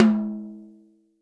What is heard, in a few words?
TOM, Real Drum Kit, FUNK KIT